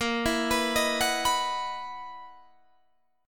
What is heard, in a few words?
F sharp 13th A sharp